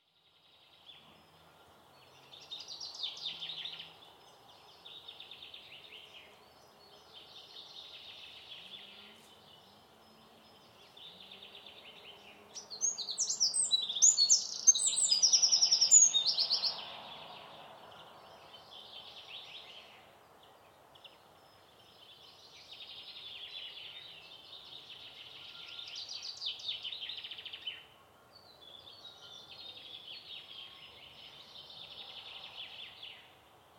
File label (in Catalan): Camí Binifaldó inici primavera matí